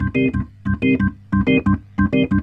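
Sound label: organ, Roots Reggae; Sounds Good - Roots Reggae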